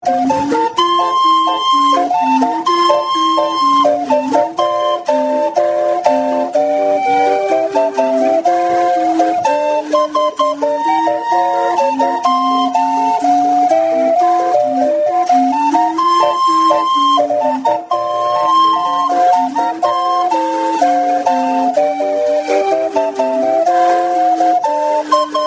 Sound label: calliope; natchez